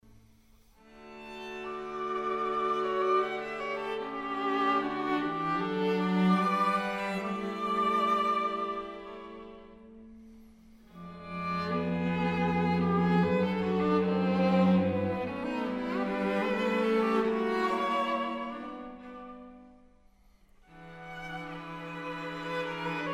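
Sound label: string trio; naumann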